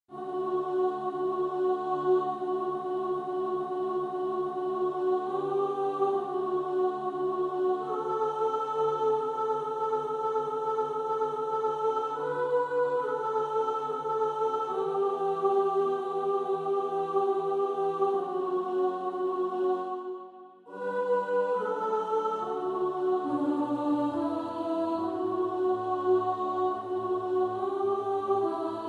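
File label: soprano, practice music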